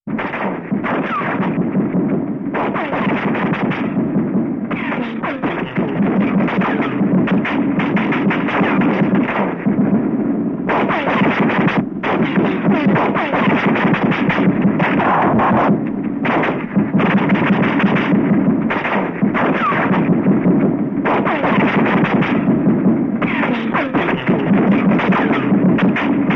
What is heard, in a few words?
Gunfire Exchange Sound